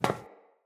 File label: Friendly NPC Step Default; Characters; Footsteps